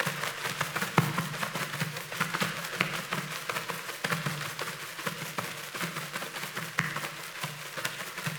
Sparrow Death State Idle Loop Wing Flaps; Animals

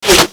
Bullet Default Hit

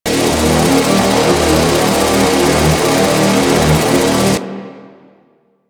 Jet Machine Loop; Ambience; Props; Warframe; The New War; Zariman; Quests

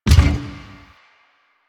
Gear Shift Down, Motorcycle; Motorcycle Ninety Nine

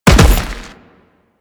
Land Heavy Dirt, Footsteps; Warframe; Teshin

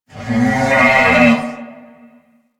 Ram Ambient Call; Animals; DE; Warframe; Quests; Duviri

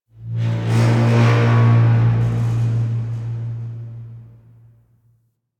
Metal Rumbles; Warframe; Quests; TheNewWar; Ambience; Zariman

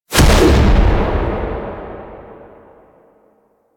Focus Blast; Warframe; Naramon

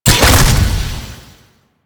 Shotgun Shot Alt C; Weapons; Warframe; Tenno; Alchemist

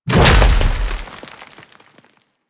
Thumper Bot Stomp B; Warframe; Grineer

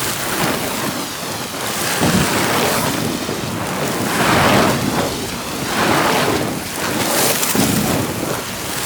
Inferno Wildfire Loop; Warframe; Ember

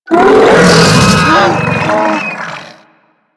Infested Juggernaut Vocal Death; Warframe

